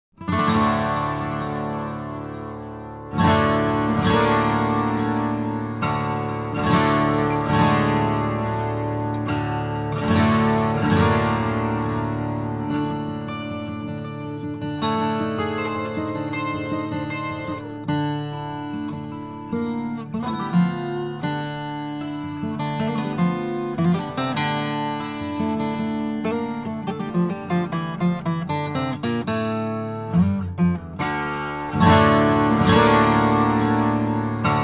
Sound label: Guitar; Diablo Anniversary